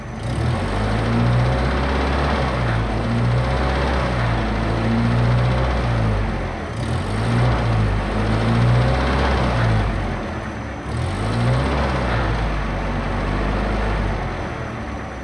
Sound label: Car Loop; android; Does Not Commute